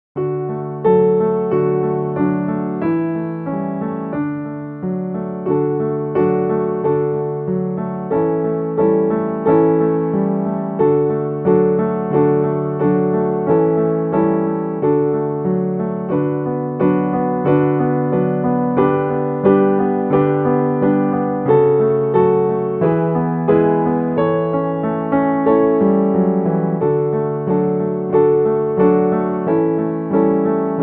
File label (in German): Playalong Klavierbegleitung